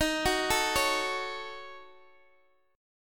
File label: Eb Minor 7th Flat 5th, down, Guitar, DADGAD